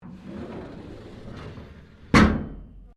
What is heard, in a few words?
DOOR GALLEY SLIDING CLOSE, Sounds onboard HMAS Castlemaine